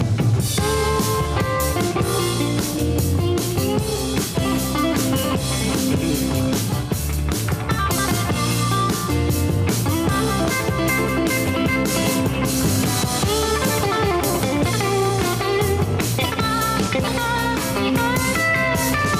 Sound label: Fender, guitar; Fender 62; Rockpalast 79